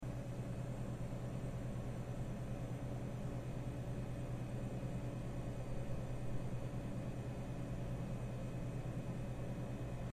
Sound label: vend mach amb